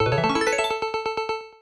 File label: game win